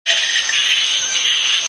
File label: BATS; Partners In Rhyme